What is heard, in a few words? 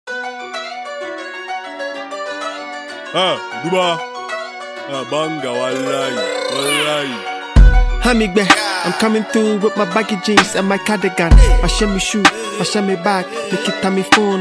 Freestyle, Ghanaian rapper